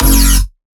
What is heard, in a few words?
Bass F# Hit, Dubstep; Bass One Shots; Ghosthack; Dubstep Sound Arsenal; 50 Bass One Shots